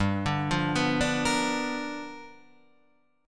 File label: G 7th Sharp 9th G, Guitar Half Step Down